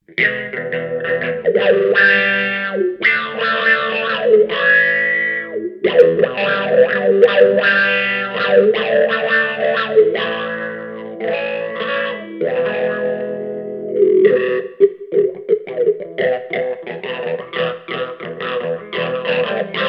electric

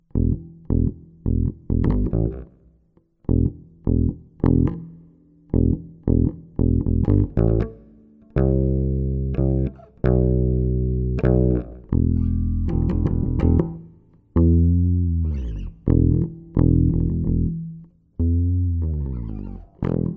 bass; MegaEpix

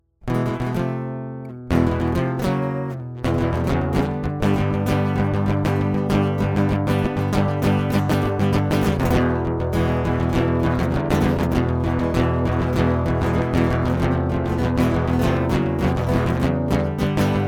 acoustic

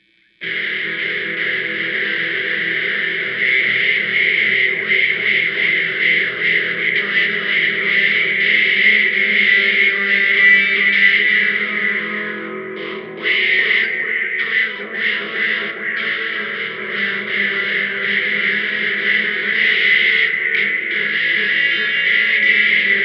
electric; MegaEpix

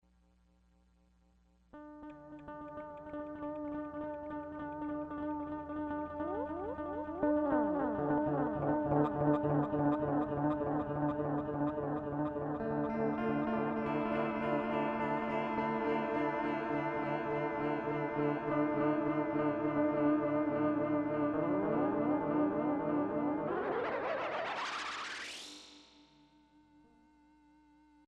digital delay; MegaEpix Enormous

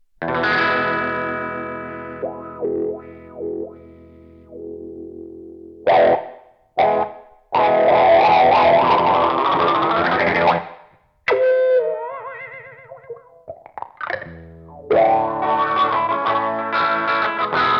electric; MegaEpix

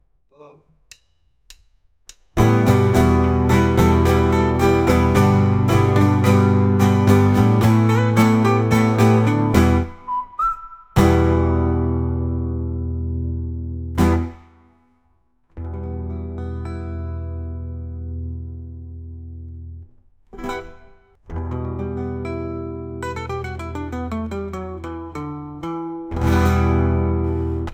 Interlude

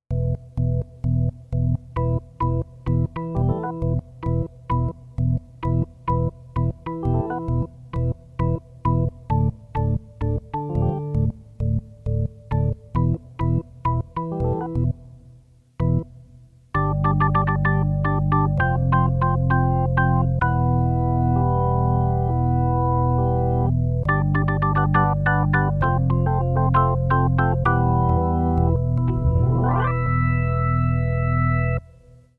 Trance prelude

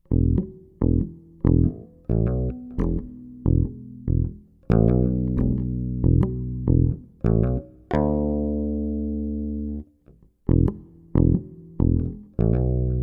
bass; MegaEpix

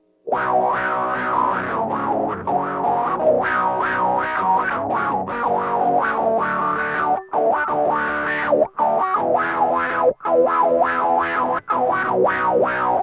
electric; MegaEpix

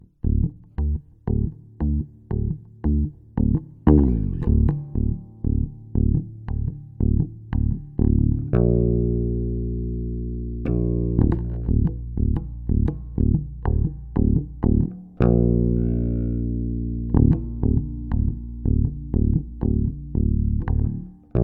bass; MegaEpix

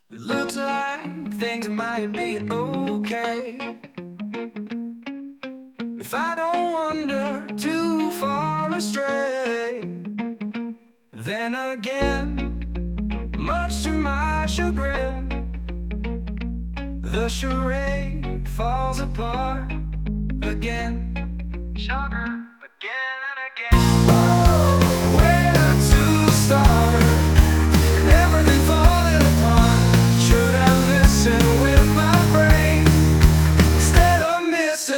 Reggae; MegaEpix

